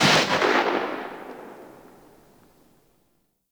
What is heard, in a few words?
Spark Rifle Shot End